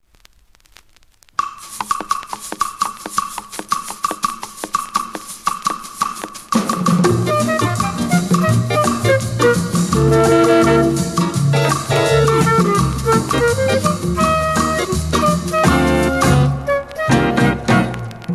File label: bossa nova